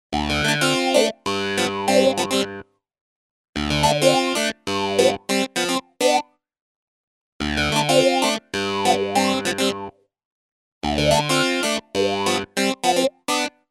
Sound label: Roland MKS-10 Planet-P clavi chorus Wah Wah